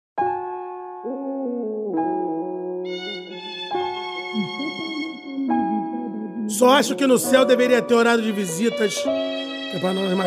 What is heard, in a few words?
dance e funk